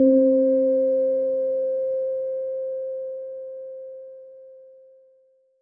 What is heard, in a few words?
Synth Prophet One Shots, Keys C; Electronisounds